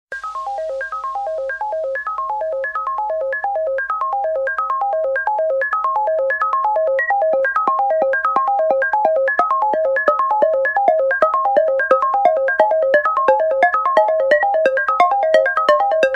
Melody

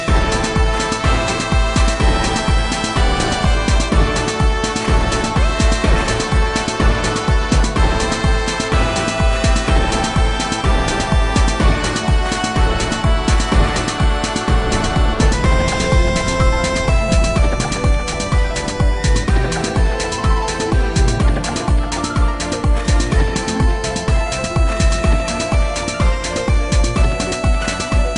Strong Horschestra

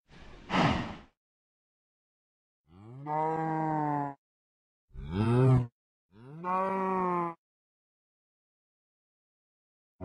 Cows

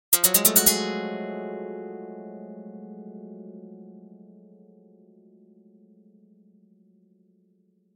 open String

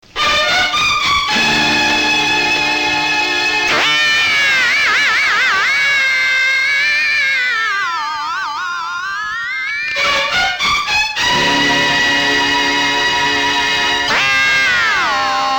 Funny Super Tune

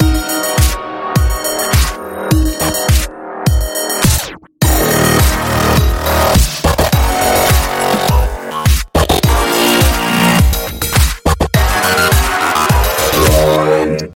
Droid ultra ringtone

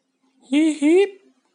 Hihi

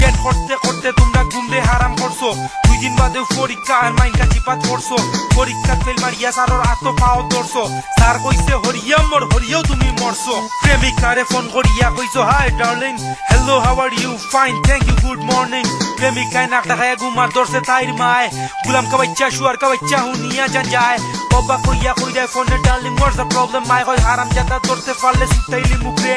Bangla Rap

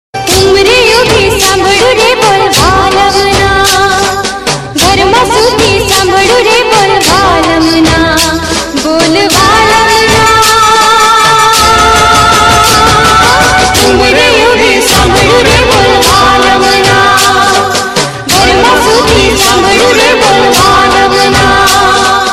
Garba Gujarati

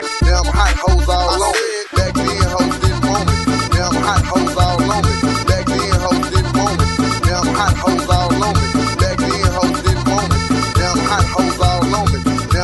Cumbia

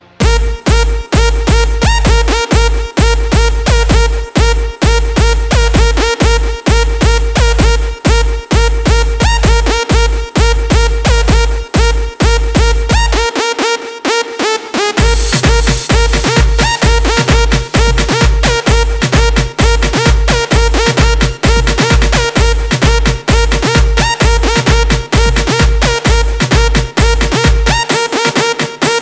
Beat

